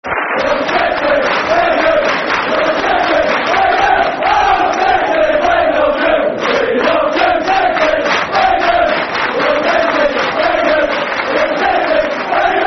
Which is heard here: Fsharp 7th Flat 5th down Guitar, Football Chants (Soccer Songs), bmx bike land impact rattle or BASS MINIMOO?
Football Chants (Soccer Songs)